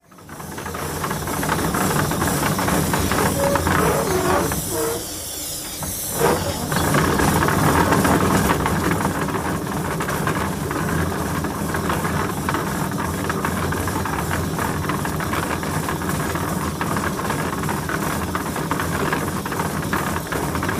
Small Steam Pump, W Metallic Machine Rumble, Gas Hiss, Pneumatic Whine In Background.